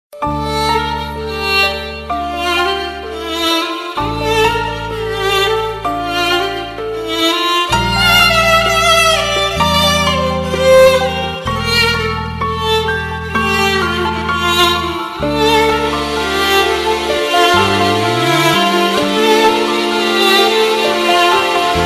sad violin melody

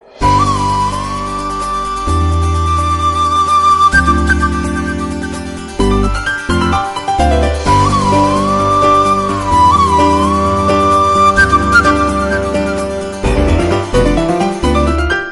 melody; Indian; Chandramukhi

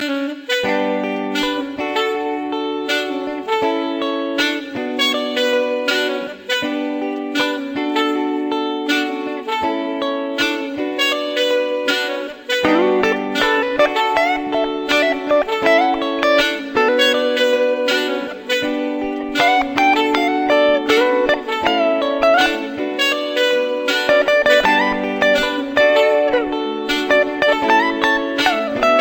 jazz

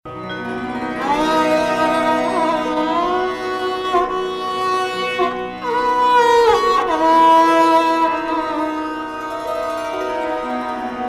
sarangi; Indian

